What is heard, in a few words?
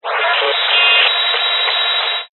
science fiction radio signal interference burst